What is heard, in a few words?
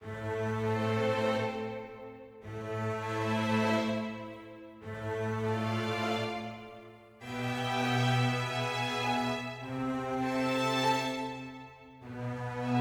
mysterious forte; Quivr; PS4 Windows gamerip 2016